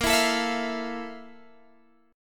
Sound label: F Major 7th Bb; Fmaj7/Bb; strummed